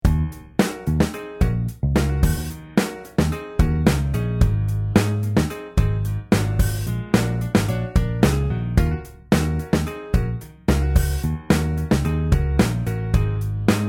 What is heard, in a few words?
Funky Rhythm